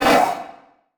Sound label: Multimedia Internet CD-Rom Flash Blast